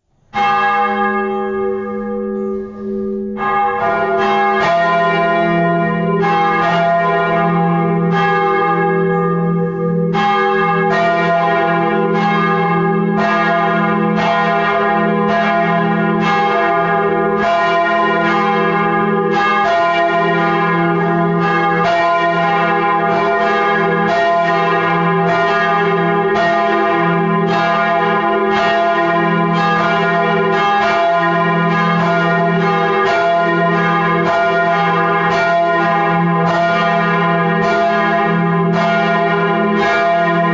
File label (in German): glocken bienenbuettel; kg wichmannsburg